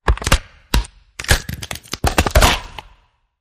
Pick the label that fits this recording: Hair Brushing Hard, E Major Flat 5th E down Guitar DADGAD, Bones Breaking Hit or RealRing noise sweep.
Bones Breaking Hit